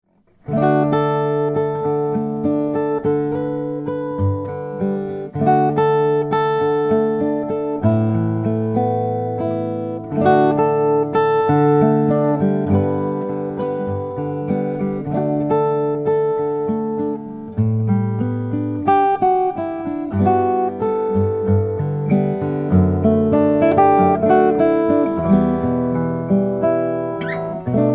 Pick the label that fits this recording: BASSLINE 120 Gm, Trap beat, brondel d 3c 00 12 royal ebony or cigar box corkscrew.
brondel d 3c 00 12 royal ebony